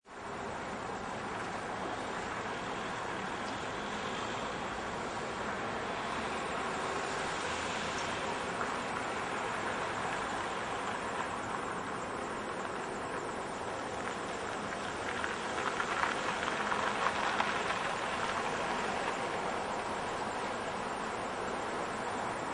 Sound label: Town Intersection Traffic - Near Traffic Lights; Traffic noise: Cars and other vehicles drive by on road. Traffic running at 25 mph. Close recor; Traffic And Car Sound Effects